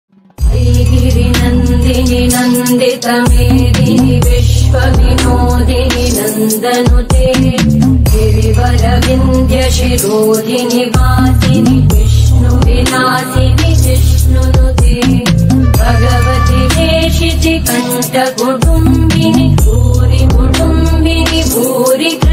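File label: Mahishasura Mardini original ringtone bass trap mix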